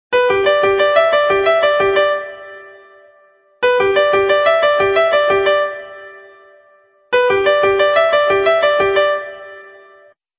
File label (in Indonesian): Nada Dering iPhone 15 SMS